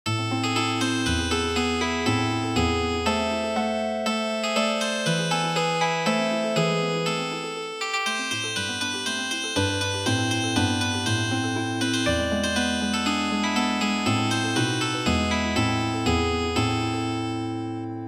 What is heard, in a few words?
Healing Harp